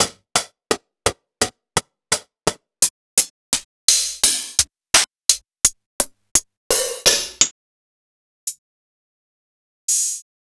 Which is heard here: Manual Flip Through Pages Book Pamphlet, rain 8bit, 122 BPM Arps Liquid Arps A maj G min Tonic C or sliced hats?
sliced hats